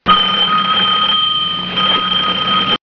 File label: Shoe Phone; Get Smart; Maxwell Smart’s Shoe Phone Tone